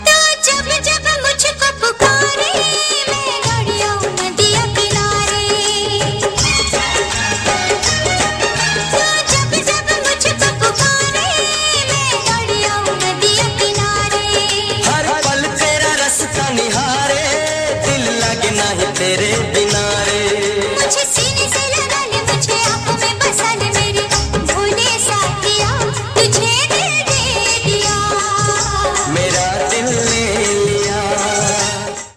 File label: hindi song; kurbaan 1991